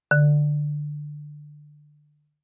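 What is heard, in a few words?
Marimba yarn fortissimo D3; MIS Pitches - 2014; Percussion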